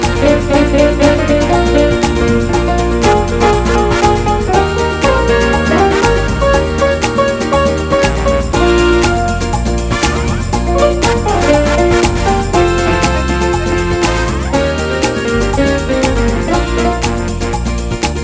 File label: Fast Samba